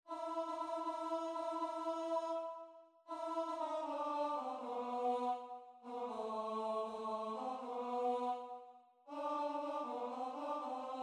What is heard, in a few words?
The Sleigh Song SATB - Tenor